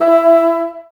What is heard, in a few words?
Bassoons E 4; Miroslav Vitous - Woodwind Brass Ensemble